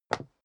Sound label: step leather shoes wood parquet single stair down; schritte